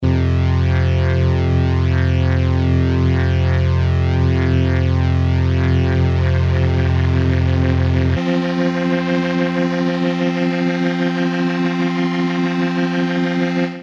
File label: prodigy rotosphere